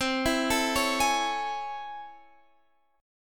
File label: A Major 7th C; arpeggiated; JGuitar